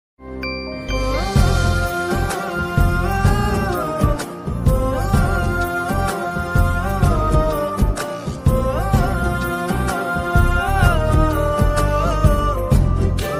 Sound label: ringtone bgm